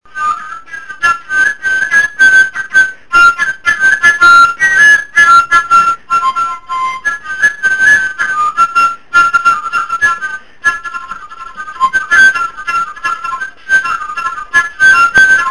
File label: flute